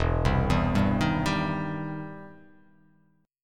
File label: E Minor Major 7th Sharp 5th, E, down